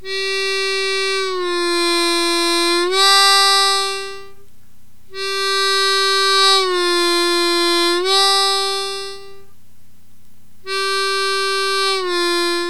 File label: bending, harmonica